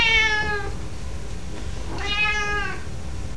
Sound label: meows